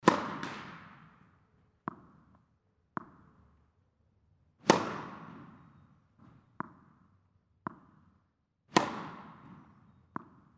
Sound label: indoor tennis serve